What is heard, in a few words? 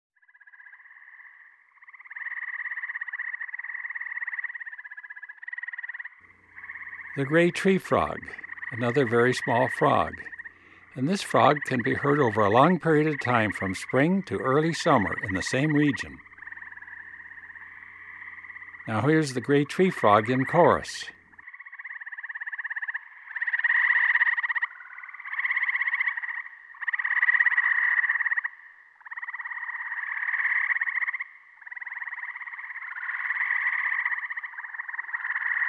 Gray Tree Frog; Eastern North America; Dan Gibson; Wildlife Identification By Sound